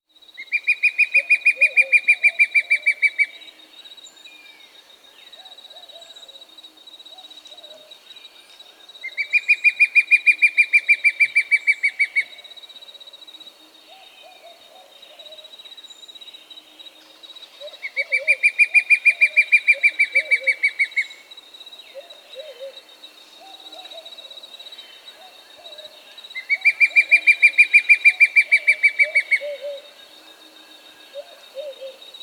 White-Backed Fire-Eye (Pyriglena Leuconota), Andean Birds; S. America